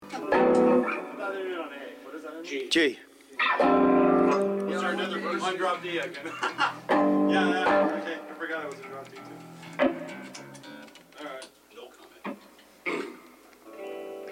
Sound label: Background Chatter Mix